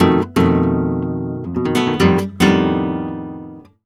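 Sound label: arpeggio guitar, Flamenco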